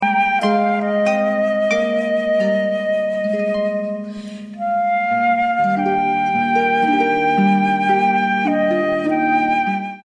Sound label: harp AND flute